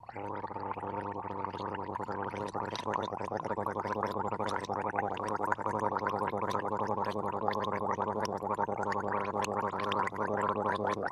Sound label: Gargling